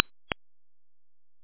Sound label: pluck